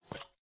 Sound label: step, copper golem weathered; Minecraft